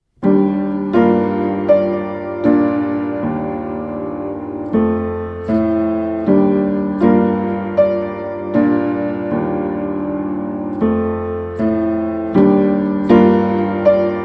Piano Rework

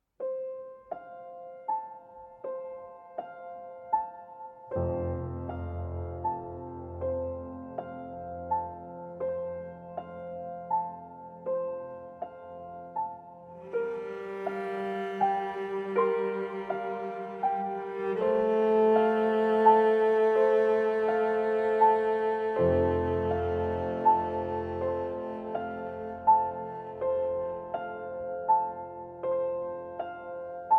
Version For Cello and Piano

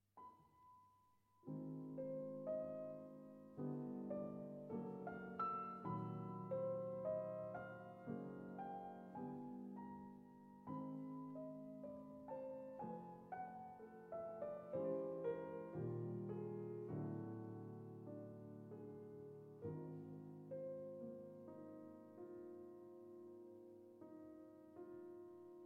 Trumpet & Piano, Thrène; Incantation, Thrène et Danse; Arr. For Trumpet & Piano

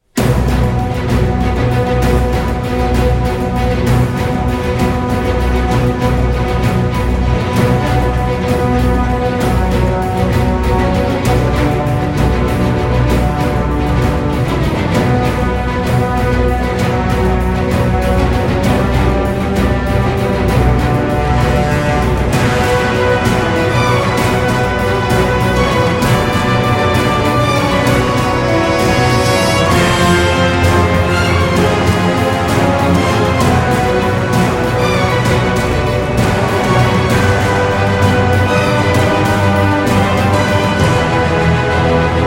Instrumental

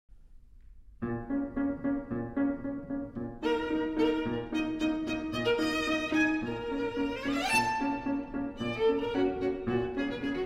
Sonata For Viola and Piano Allegretto

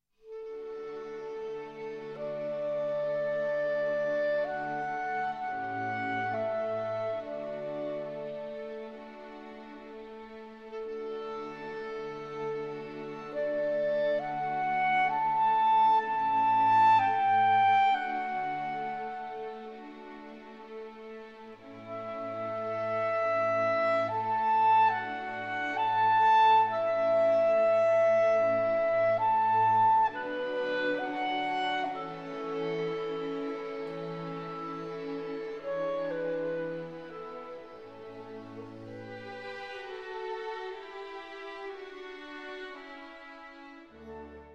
Clarinet Concerto in A Major, Adagio

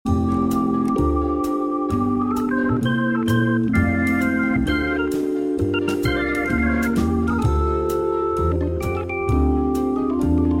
Instrumental Blues

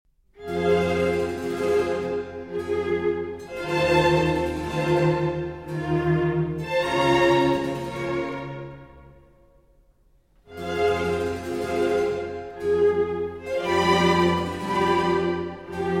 Flute Concerto in C Minor

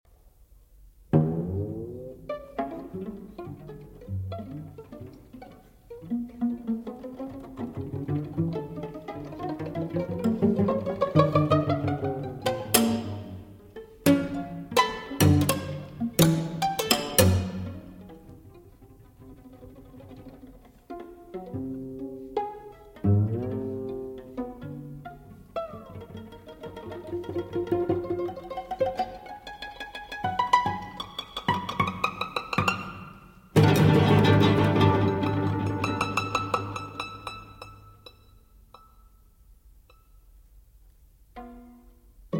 Quatuor, Etudes, Pizzicati; Quatuor III; 9 Etudes

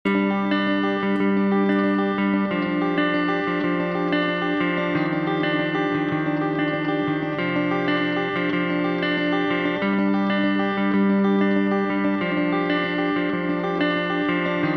Fantas For Electric Guitar

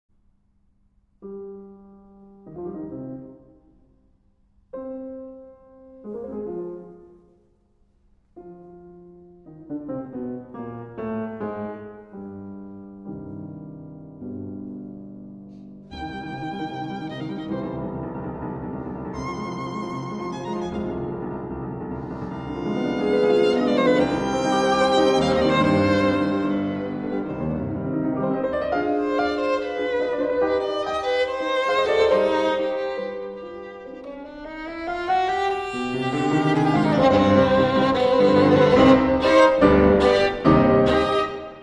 Violin Sonata in C Minor, Allegro Con Brio

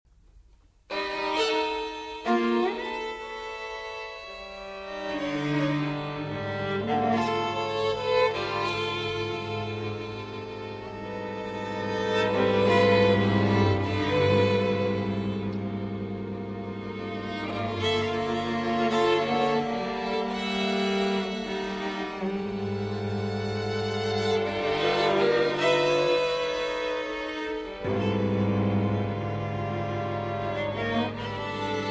Quatuor, Etudes, Multiple Stops; Quatuor III; 9 Etudes

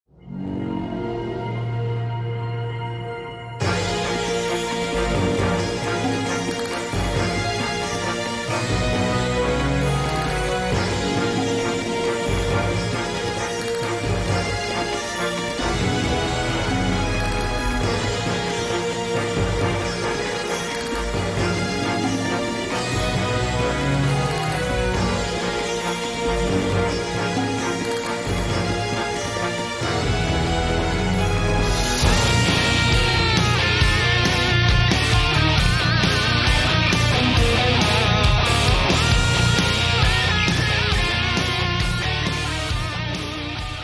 Seven String Sting

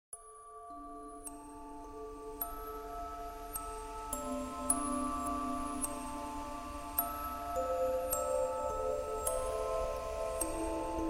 Inhale

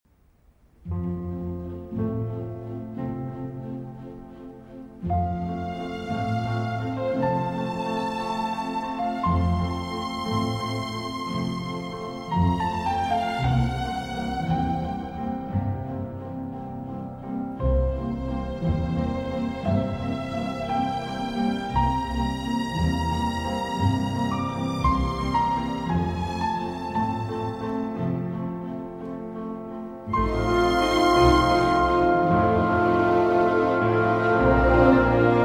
Piano Concerto No. 21 in C, Andante; K467 Elvira Madigan

